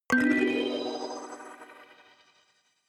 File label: notification kalimba magical third up